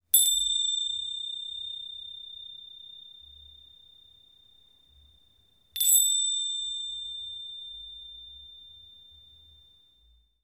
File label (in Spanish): Tingshas medianas de 5.7 cm